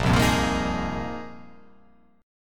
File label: A sharp 7th Suspended 2nd Suspended 4th